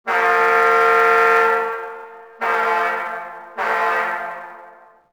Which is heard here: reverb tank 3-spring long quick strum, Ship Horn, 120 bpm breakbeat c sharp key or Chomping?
Ship Horn